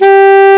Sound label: G2 SMO SAX; REED; AKAI S-Series CD-ROM Sound Library VOL-8